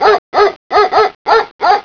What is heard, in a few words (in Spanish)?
perro; jclic